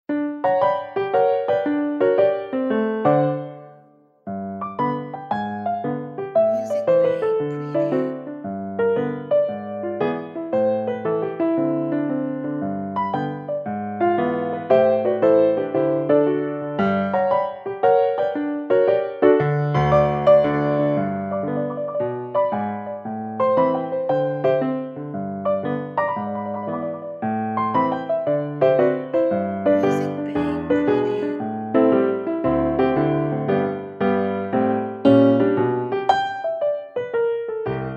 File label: Piano Happiness